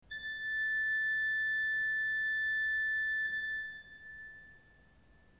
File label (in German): ton, orgel